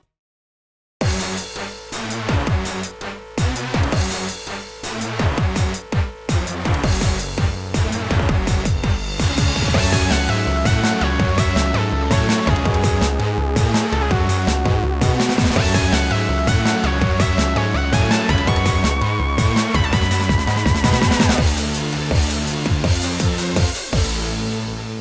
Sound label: BGM Music; YuGiOh Over the Nexus